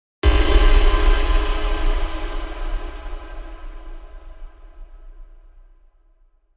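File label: Impact Metallic Clang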